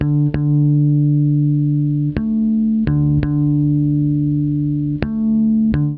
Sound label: cool loop, guitar loops; COOLLP; USB Soundscan vol.31; Miro s Grooves; AKAI